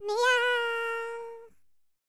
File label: HIMALAYAN, World Voices; ILIO; Vocal Planet VOL-5